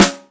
Sizzle Steel Snare Drum Sample G Key, acoustic snare sound tuned to the G note